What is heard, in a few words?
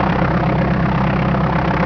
Cargo Plane; Chase Hollywood Stunt Driver; XBOX